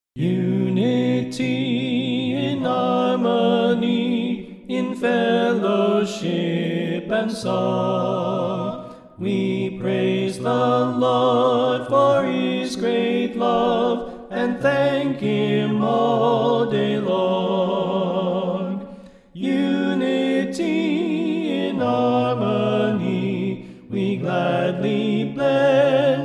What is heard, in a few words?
Unity In Harmony Soprano